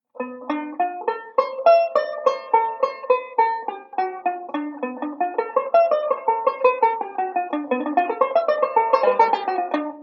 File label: ii V Speed Drill, banjo